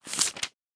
taking bills out of wallet

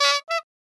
honking horn

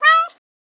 cat meowing

